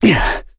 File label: Vox Landing; en; voices; heroes; KaselB; kings raid